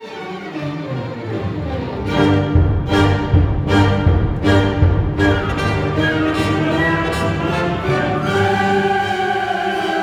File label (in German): Orchester Klassik